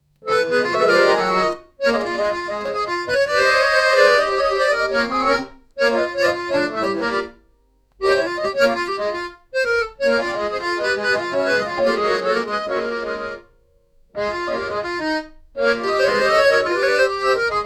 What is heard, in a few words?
comedy jazz accordion slo